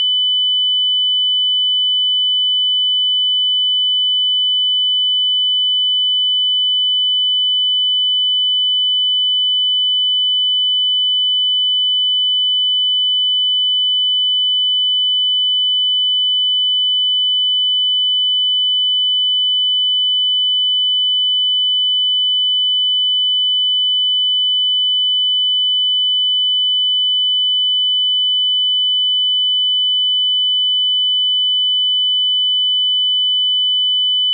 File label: 3kHz -12dB; 基準信号; 3000Hz -12dB 100秒; 440Hzか3000Hzの使いやすい方を使用してください。